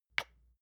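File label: lotion large lid open soft air, hairdresser; berufe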